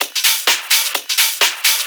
Discohouse Loop 128 BPM

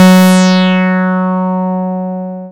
Dance Floor Bass, Multisample Basses

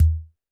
percussion, Roland TD-20

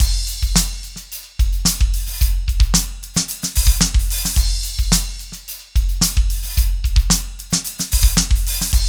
110, loops, hyper dance; Best Service

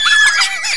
Applause; Power Drive

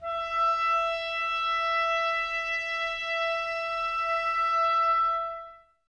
wind clarinet, Clarinets, Wood Winds

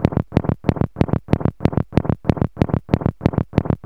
HARD PULSE; Zero-G Ambient 1; CLICK+PULSES

